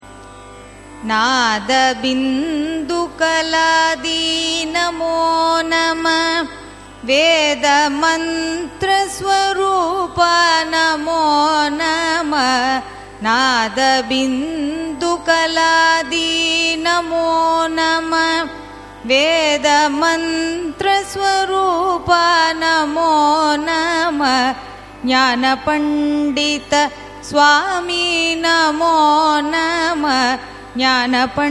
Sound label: raga senjurutti carnatic; carnatic music